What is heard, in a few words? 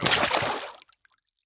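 water run, footstep; fst water; skyrim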